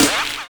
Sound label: RAIN BEAT; Univers Sons - Kitch 70s